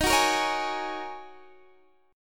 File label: D sharp Minor 11th D sharp Guitar Open D, chord